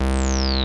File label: TB303